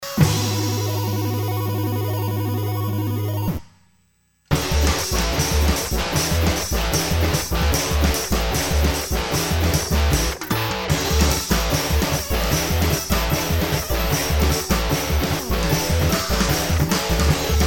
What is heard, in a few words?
drum chip